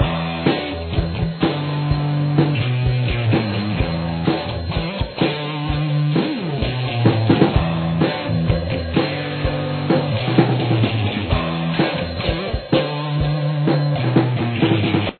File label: chorus, guitar